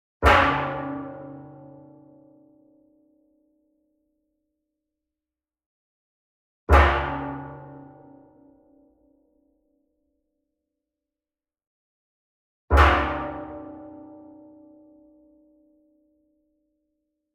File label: Sweetener Metal Pan Flam Medium; boom library; Cinematic Strikes Construction Kit